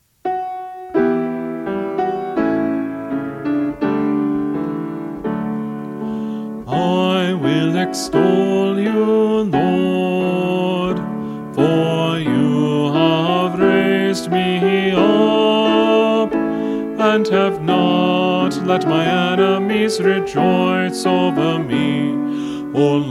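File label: Melody, Choir Practice Tracks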